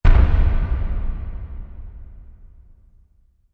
Bass Impact Low Drum; impacts

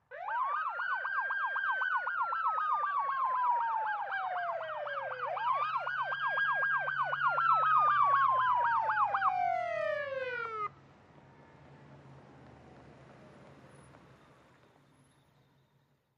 Ambulance Fast wail

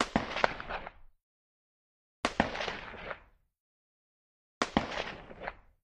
Russian SKS Single, sks 7x6

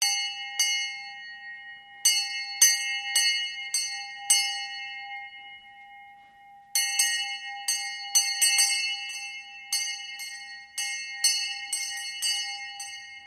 Bell Bell Ringing Slo

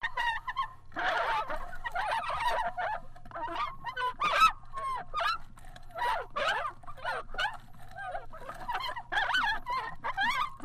Mechanical, Vocals; Group; Group Of Mechanical Critters, Like Puppies, Whines, Whimpers; wheels and casters

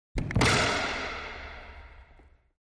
Body Slamming Into Racquetball Court Wall

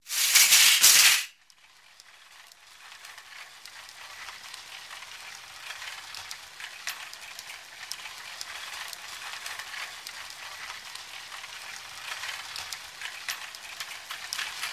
Shopping Cart

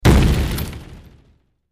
Small Impact Medium E, metal & glass

